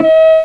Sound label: FLUTE; Sound Effects for Multimedia - Volume 1; wind instruments